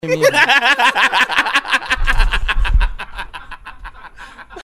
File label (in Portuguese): risada do jovem